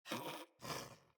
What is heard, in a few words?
Pet Snore; Catbrow; Pet Ship